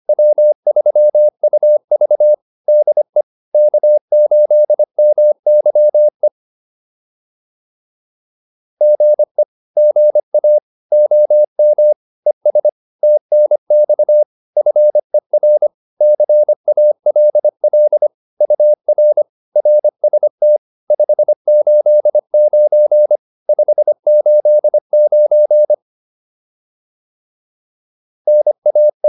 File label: QSO, intermediate practice files